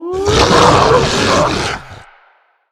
Spirit Wolf Play

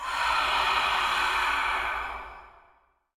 Ghost Underlay Play

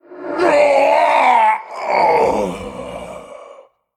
Death

